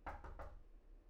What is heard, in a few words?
knock; IEEE AASP